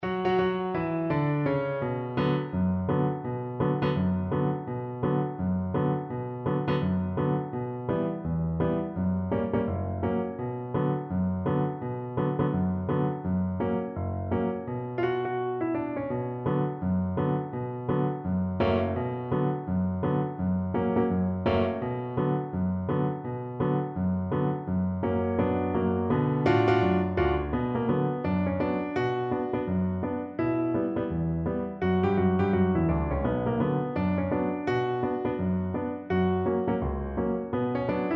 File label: Bass Guitar